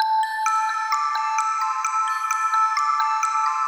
water lily pond

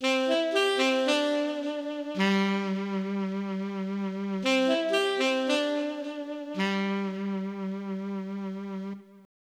korg i3 sax; Korg i3 Test

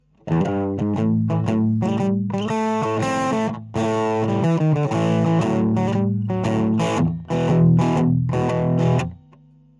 bluesy

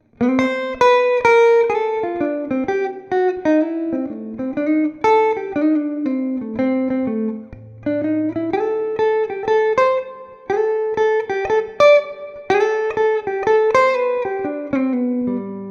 lick neck clean, epiphone sg g-400 pro

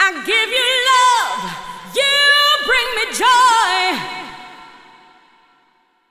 Alto Zephyr ZMX124FX USB FX reverb delay

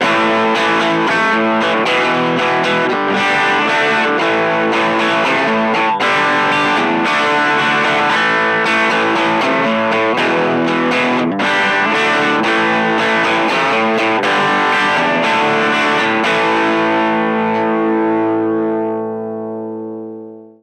overdrive full

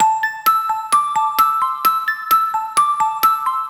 cocktail faeries

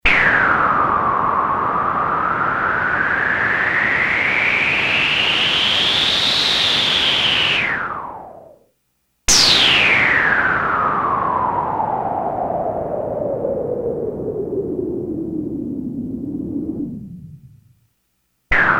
transition effects, analoger Synthesizer; Behringer Model D; Kompakter Desktop Synthesizer

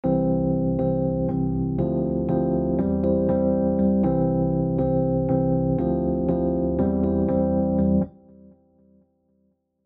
session keys electric s; e-instruments

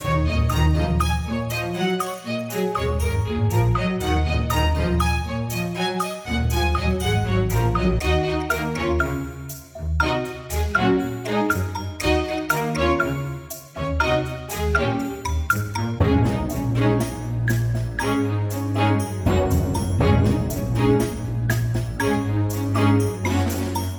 orchestral groove kit; presonus symphonic orchestra test

